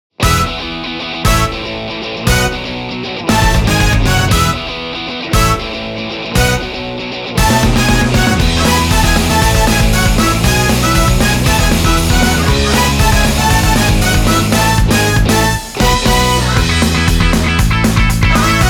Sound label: Instrumental